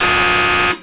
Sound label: buzz